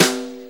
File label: Brush Snare; Sonic Foundry (Sony Creative Software); Drum Tools; Brushes